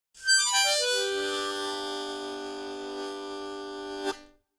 Mouth-organ, Descending Chords Whit Long End Type 3 - Major, Tonic Chord; woodwinds